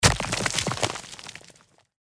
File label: obstacle destroyed stone